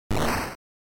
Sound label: General Noise Phrase